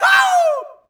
zip kick end

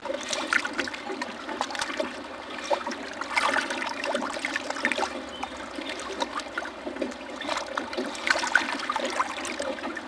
water lapping stone; ambient; World of Tanks Blitz; pliego

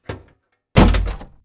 Vehicle Hatches; Red Orchestra Ostfront 41-45

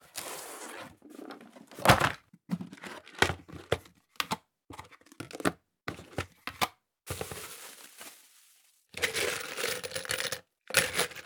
box looting; Escape from Tarkov

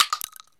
12cal shell concrete; Escape from Tarkov

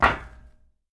run metal; Escape from Tarkov

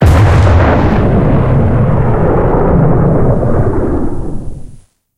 cannon 122 plus tail far; guns; tanks; War Thunder

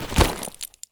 body drop slate; Escape from Tarkov

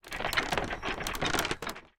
bridge crk; tanks; War Thunder

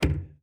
Aircrafts Cockpits Stick Pitch Lim; DCS

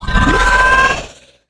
dragon scream

